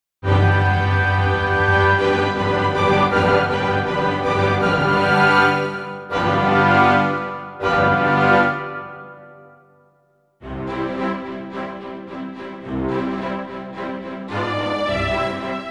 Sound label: Full Orch Accom; La Traviata